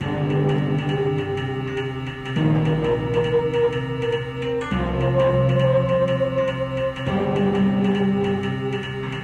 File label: Creative Pebble V3 speaker sound